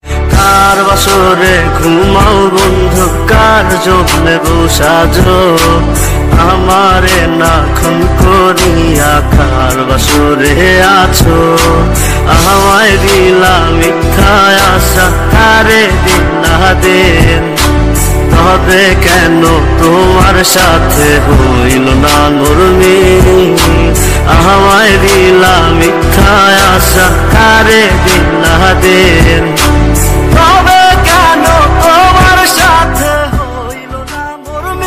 New Bengali Song Ringtone

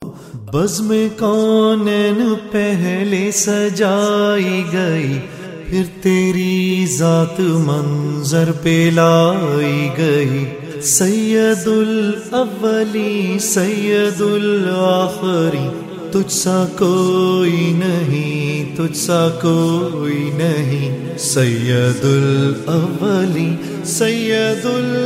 Islamic Ringtone

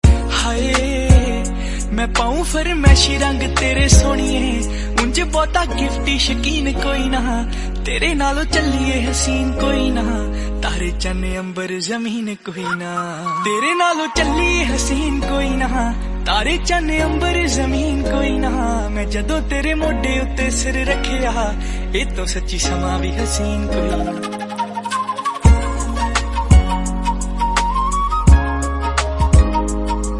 Punjabi Ringtone

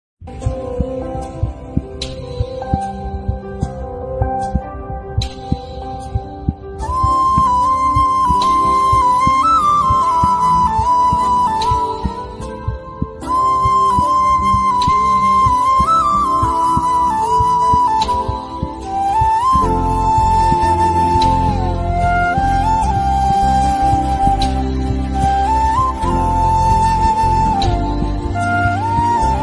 Heartbeat Instrumental Ringtone; ringtones

